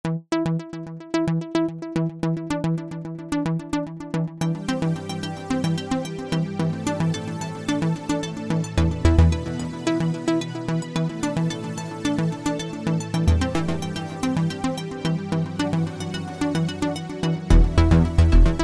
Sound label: Arps